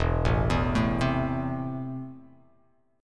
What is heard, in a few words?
E Suspended 4th Sharp 5th E; 0,0,2,2,0,x,x,x; down